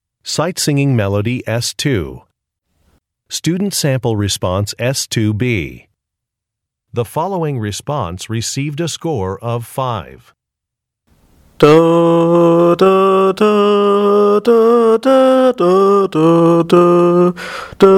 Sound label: music samples; Sight-Singing; Sight-Singing Sample 2B